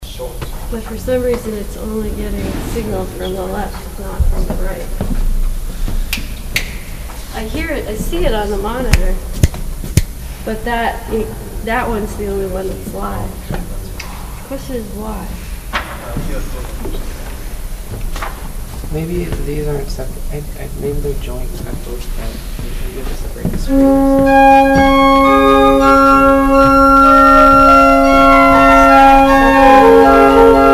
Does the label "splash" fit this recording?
No